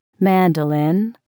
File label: mandolin